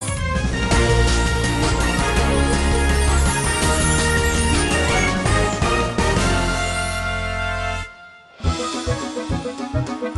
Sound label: Victory!; miraculous crush android ios gamerip 2019